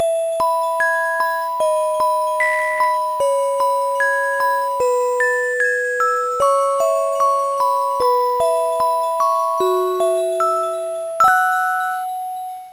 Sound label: music box; Aix